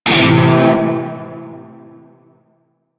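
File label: Shawzin Pentatonic Major Chord K; Music; Warframe; Narmer Shawzin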